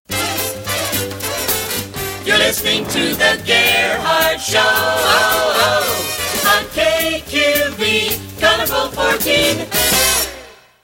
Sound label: Jingle